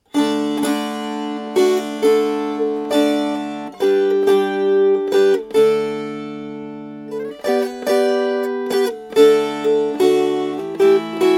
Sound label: Strummed